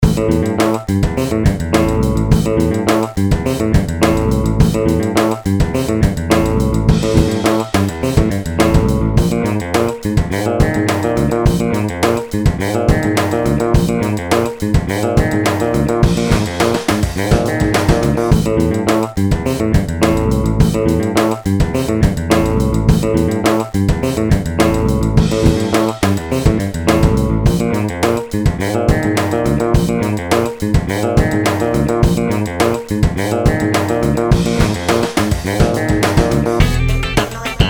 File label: acid rock